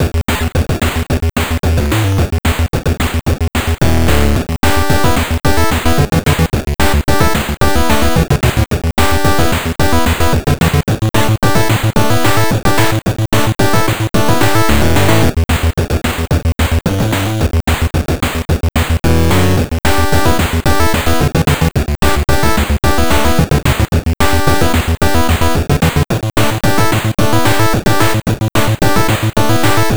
Fast Level

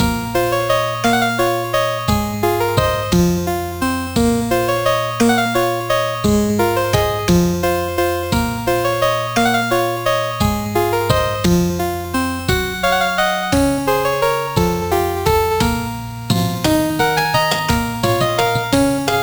CHIPTUNE Loop; The Old Tower Inn

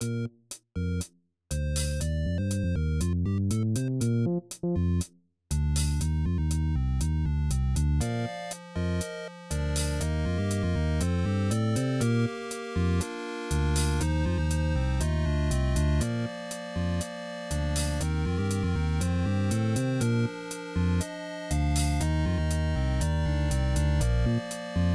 game bgm

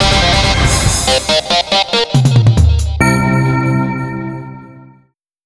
jingle

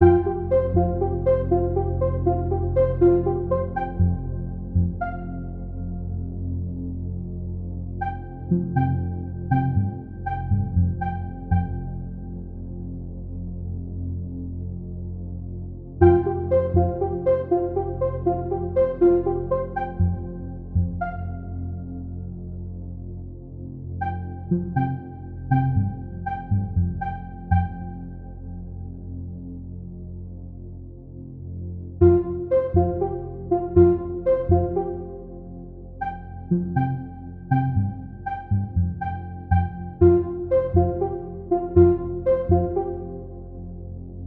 the oasis or resting place, free music; lvl 5

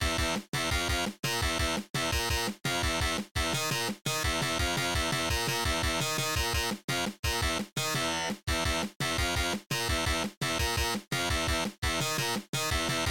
sega-style fm synth remix

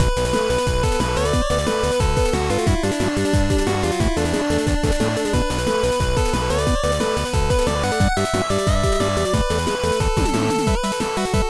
8bit On The Offensive

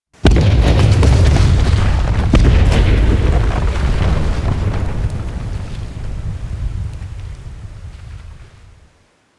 ship destroyed short

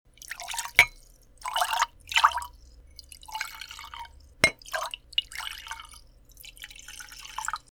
water sounds

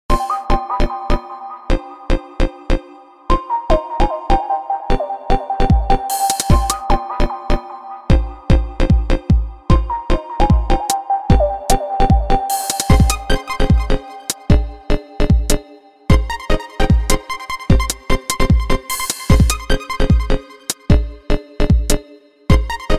boss battle loop

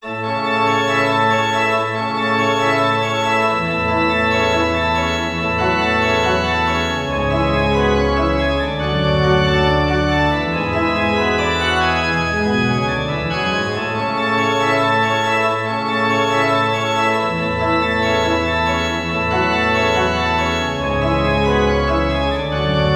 prelude in C major style organ

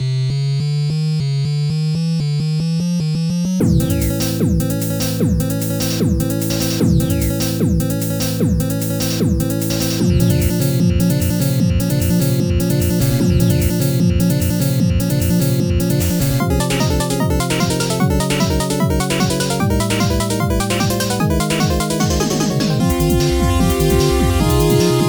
minor boss

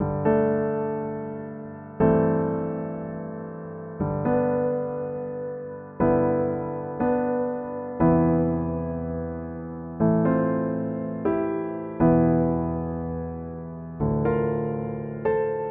chords

